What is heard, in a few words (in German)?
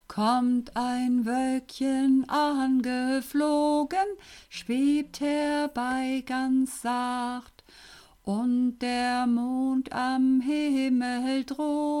Alt Maenner, Uebungsaufnahmen